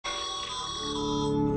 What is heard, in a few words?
message